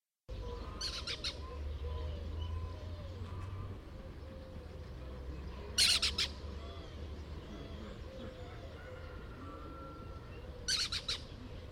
Rodfalk

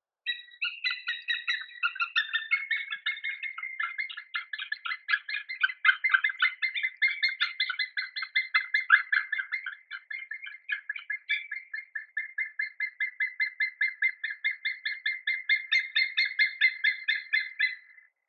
Havsorn

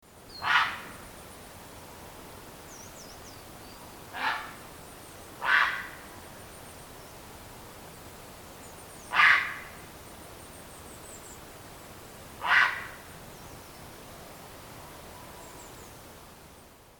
Grahager

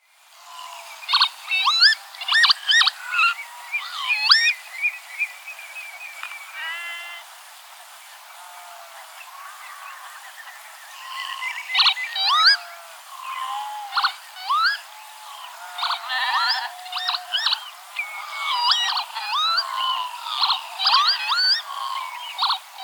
Tofsvipa

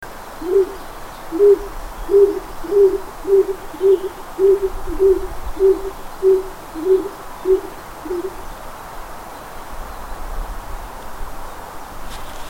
Skogsduva

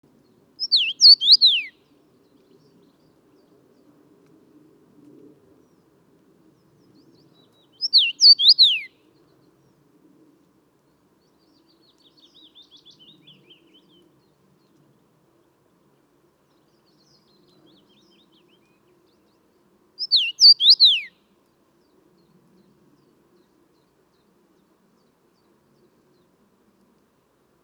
Rosenfink

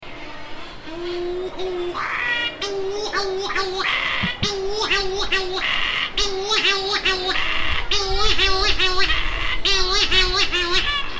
Gulnabbad lira

